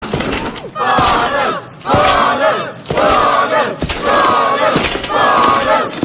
cheer; plvspw; barnham

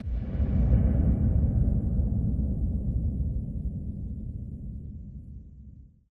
thump

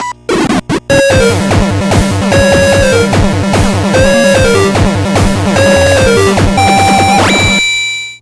game start

